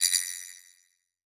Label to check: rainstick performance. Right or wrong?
Wrong